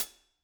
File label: room Cl Hat, drum